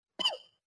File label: Laser